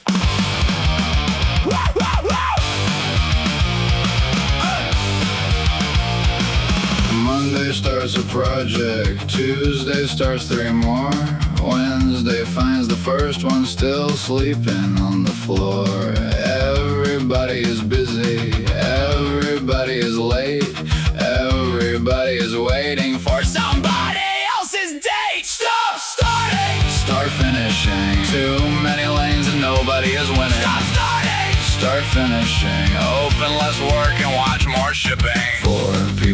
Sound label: full kit rock